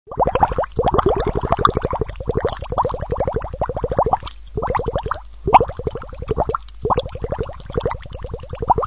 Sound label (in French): liquide bulles